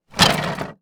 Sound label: Foley Wood Pieces Black Tub Rattles